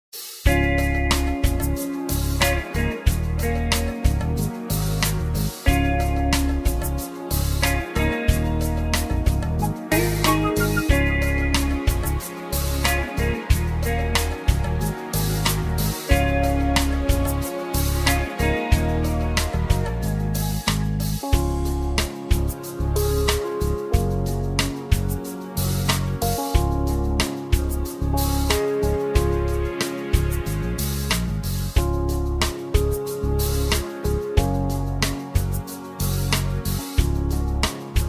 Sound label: (Db) No Backing Vocals